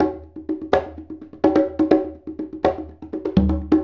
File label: Congas; Essential Percussion